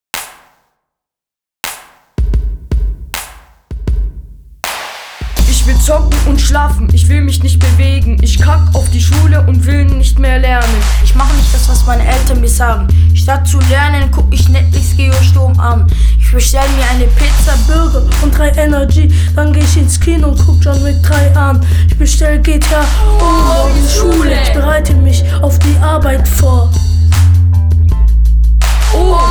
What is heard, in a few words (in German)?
Rap; Martinsschule